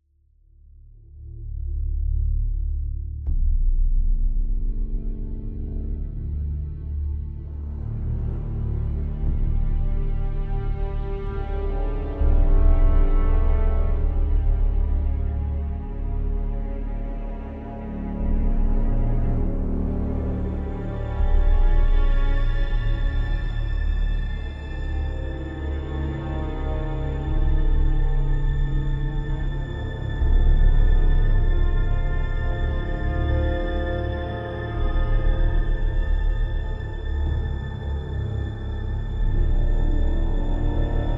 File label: Loop Ominous; Game of Thrones: A Telltale Games Series